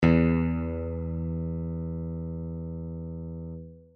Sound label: guitar low e